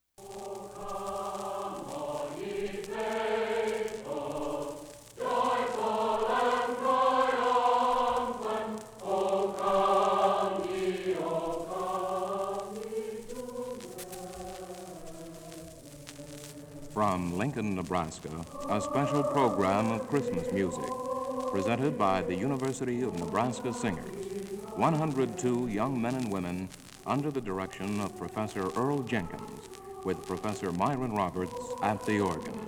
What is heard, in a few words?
choir